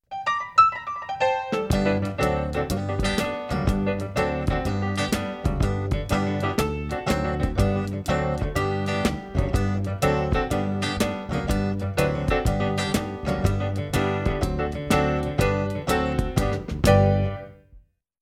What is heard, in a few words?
hot cross buns honky tonk piano only